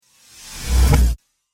Trailer Hit Reverse Swish

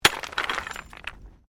Digging Pick Stones; Various digging tools and processes; Construction Sound Effects; Construction (Sound Effects:Backgrounds)

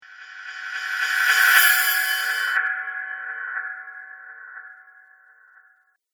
Reverse Cymbal Whoosh

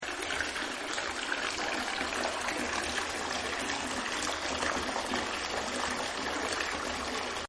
Bathtub Running Water Loop; Bathroom; Household

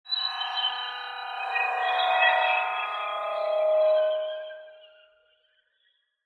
Sci Fi Feedback Swells; Sparkle; Blastwave

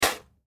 Human Spit; Spit Toothpaste Sink; Spitting; Blastwave

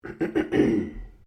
MALE CLEAR THROAT; Clearing Throat; Human

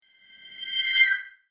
Fairy Sparkle Whoosh

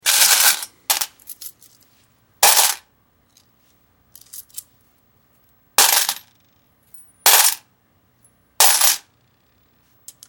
Foley Coins Drop Metal Bucket Light Handling; Money; Sound Effects:Objects; Big Room Sound

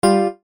chime electric keys stab; Multimedia; Chimes; Blastwave

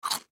Human Bite Food; Bite Food Chip Chomp; Eating; Blastwave

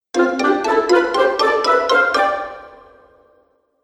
Cartoon Fun Musical Element

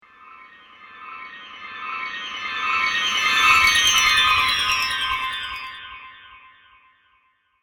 Riding Through Horror, Scary Soundscape; horror sound effect

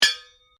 Strike Metal On Metal Heavy Ring

